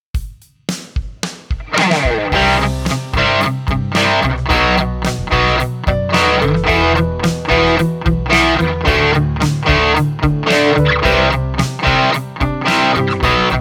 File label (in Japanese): コーラス RATE0; boss gx1